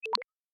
Digital Click; Robobeat

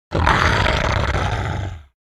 metal Jaw Growl; timez attack

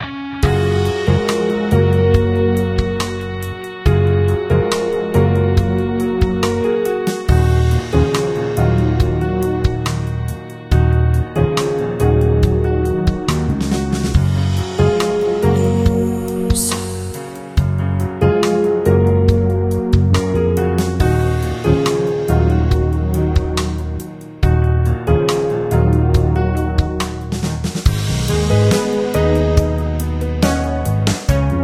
Instrumental loop; doomsday clicker android ios gamerip 2016; No Mates

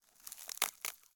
ice

